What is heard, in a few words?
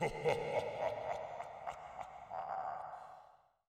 die laugh; angband